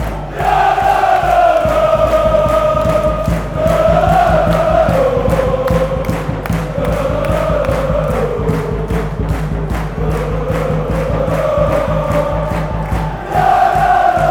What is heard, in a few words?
fanchants